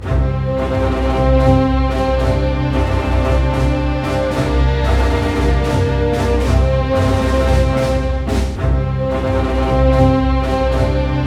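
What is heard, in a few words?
112 war, orchestra; East West; Scoring Tools; War Status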